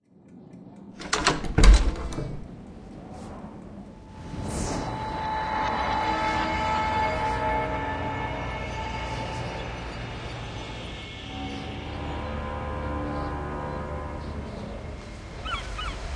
door; house of 1000 doors serpent flame

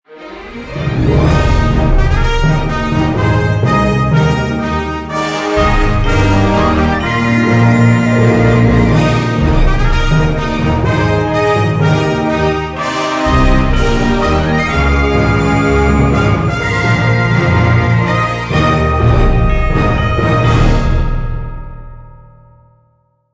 Jingle Travel Theme; Jack Keane

